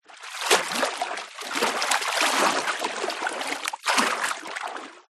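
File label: nature water movement splash processed for stereo width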